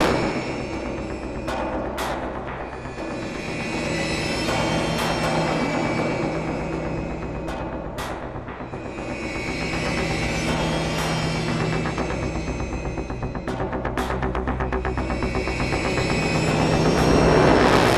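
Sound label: quiet loop; transformers war for cybertron gamerip; MATRIX OF LEADERSHIP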